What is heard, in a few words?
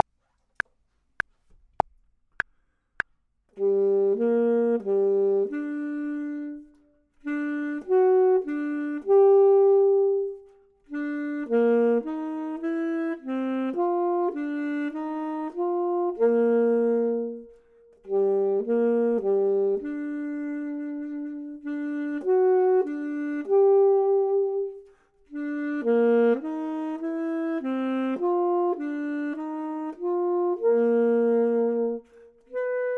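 bottom, Saxophone and Clarinet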